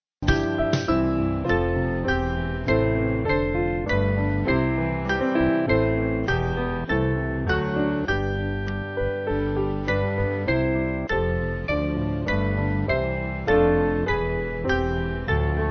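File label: Band, Music Backing Files for Churches; St Anne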